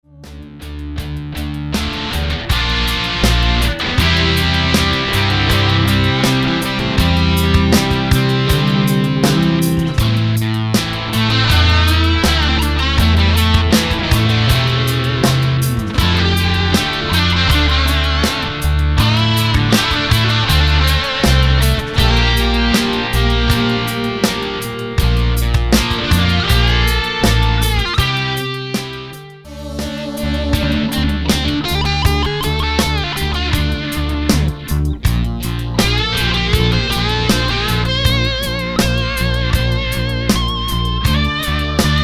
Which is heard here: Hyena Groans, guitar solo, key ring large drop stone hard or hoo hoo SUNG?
guitar solo